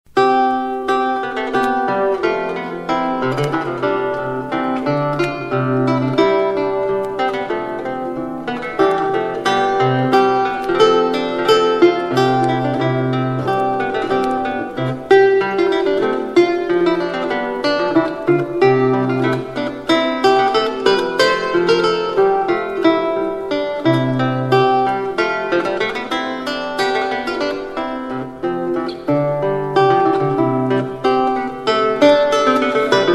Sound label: lute